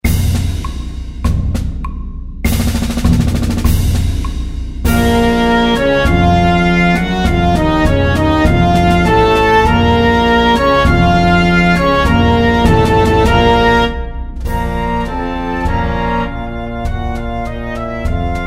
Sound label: March in C minor